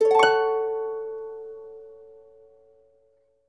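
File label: harp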